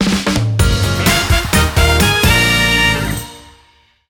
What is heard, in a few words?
Music Menu event